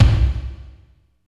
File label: KICK, Tekno Industrial; East West